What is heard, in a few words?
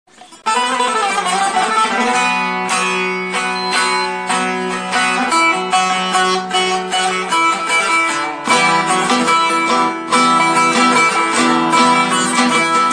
instrumental music turkish without word